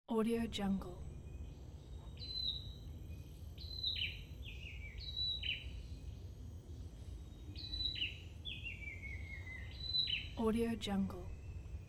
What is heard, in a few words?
Birds Chirping